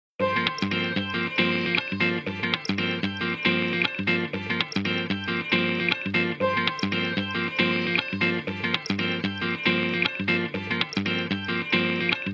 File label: Jazzy Guitar; Nokia; 8800 Arte; Ringtones